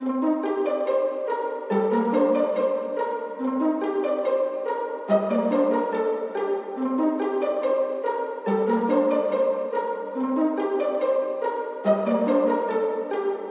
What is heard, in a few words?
71 BPM G Min Violin; Trap; Melodies; Loop Stems; Cymatics; Trap Starter Pack; Purple Daze